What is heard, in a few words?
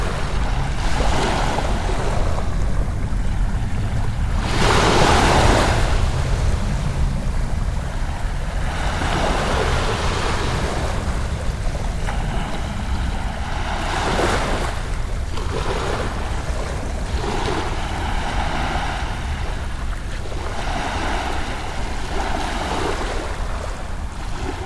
ocean wave